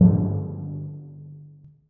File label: timpani p lh f#1; Percussion; Sonatina Symphonic Orchestra